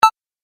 Game App Correct Beep; Video Game Sound Effects

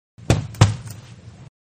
Boxing Punch left right combo To The Body; Sports

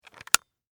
Thermos Close, Foley, Thermos, Flip, Top, Close; Lid Sound Effects; Objects; SoundEffects-BlastWave

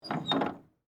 Handle Squeak, Handle, Squeak, Metal; Foley; Hand Tools

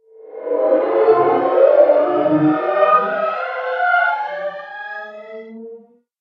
Macabre Drone, scary soundscape; Horror Sound Effect

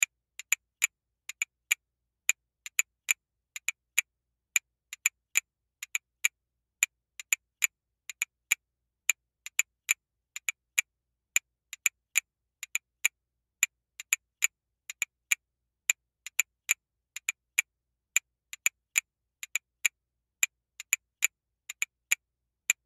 Castanet Rhythm Beat Loop; Miscellaneous Musical; Blastwave

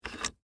Metal Handle Up, Foley, Metal, Handle, Up, Squeak; Machinery (Sound Effects:Machines); BlastWave

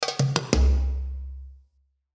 Percussion Ethnic; Musical; Blastwave

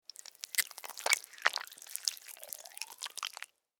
Worm, Crawl, Movement, Slimy, Wet, Insect, Bug; Blastwave; Animals:Insects

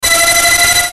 Ascend Bright Electrical Filter; Blastwave

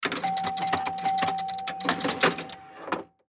Pinball Bumper, Pinball Bumper Hit Ball Ding; Pinball Bumper Hit Ball Ding Multiple; Arcade; Blastwave

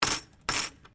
Coin Penny Multiple Drop Wood; Common coins; Money; Objects

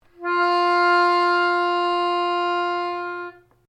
PIANO ACCORDIAN F4 LONG, Old Piano accordian; Pianos (Sound Effects:Musical)

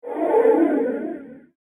Aircraft Whoosh

